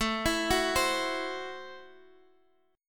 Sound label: A Minor 6th A Guitar Drop D